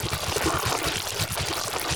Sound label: Fatfluke Fast Loop